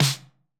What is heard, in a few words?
snare